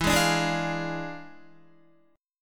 E 7th Flat 9th, down, Guitar; E7b9; strummed

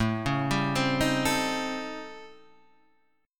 A 7th Sharp 9th Flat 5th; A7#9b5 Chord {5 4 5 5 4 5} arpeggiated

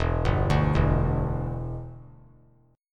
E Minor 7th, down; arpeggiated

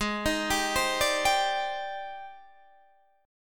Ab Major Sharp 11th Ab, Guitar DADGAD; 18,18,17,17,17,17; down

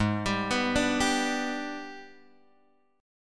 G sharp Minor Major 7th Flat 5th, chord; G#mM7b5 Chord {4 X 5 4 3 3} arpeggiated

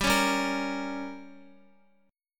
G Diminished, Guitar; strummed; x,x,7,6,7,6; 4F,4A,5C,5G,5C,6E; JGuitar

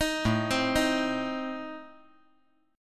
A Diminished A down

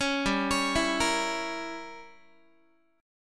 G Diminished 7th, Guitar; Gdim7 arpeggiated

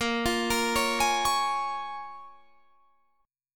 C sharp 6th A sharp

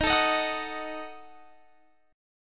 Eb Diminished, Guitar, Open F; Ebdim strummed

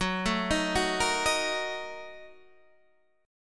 Bb Major 7th Suspended 4th Sharp 5th, Gb, chord

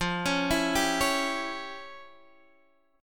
E Minor 6th F; Em6/F; arpeggiated

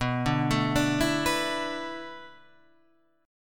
B Minor 11th B; Bm11 Chord {7 5 7 7 5 7} arpeggiated

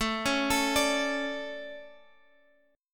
A Major Add 11th A, chord; Aadd11; arpeggiated; JGuitar

